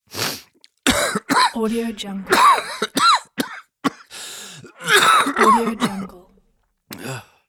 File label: male sickly cough